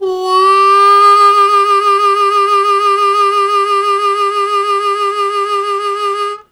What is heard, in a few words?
Strong Vib w Scoop Ab1, Harmonica; Zero G